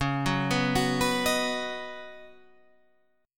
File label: C sharp 9th Suspended 4th C sharp, chord